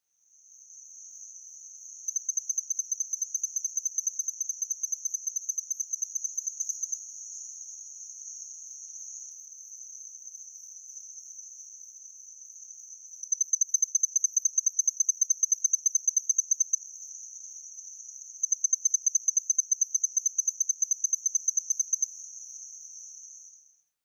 iso kanetataki

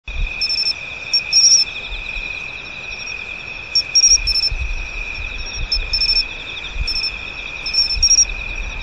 matsumushi yodogawa

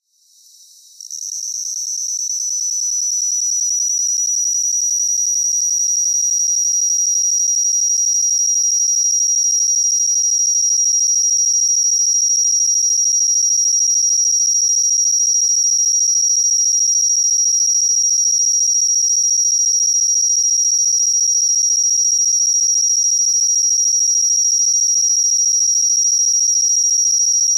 kumasuzumushi

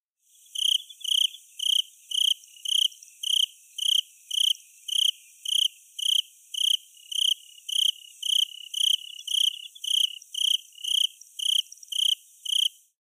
tsuzuresase

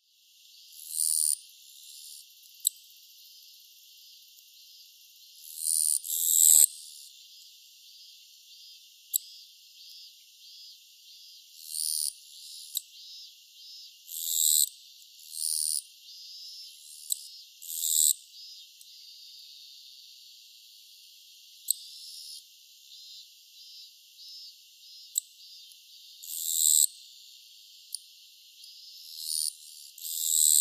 nishi kirigirisu

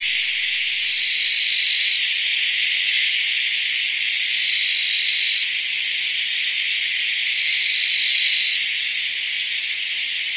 cold madarasuzu

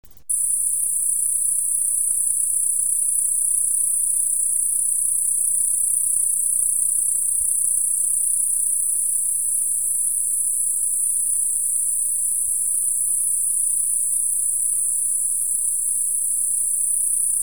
oo kusakiri

